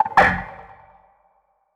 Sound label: strider vox ping; creatures